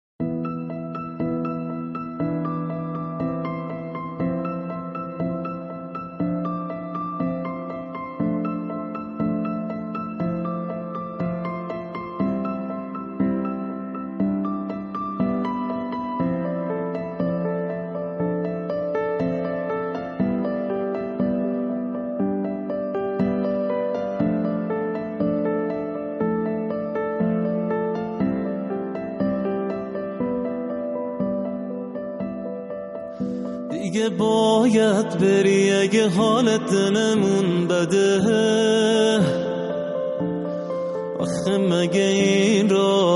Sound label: Piano Version